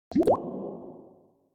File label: bubble